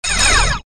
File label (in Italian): suoneria botton; beep